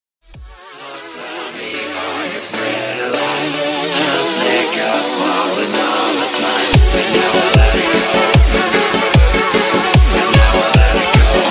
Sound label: buildup; TF2Maps; chillskies; wubwubwub